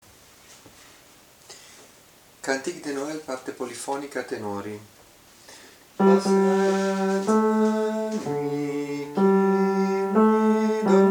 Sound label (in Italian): tenori; Cantique Adam